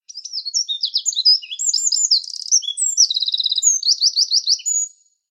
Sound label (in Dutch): vogel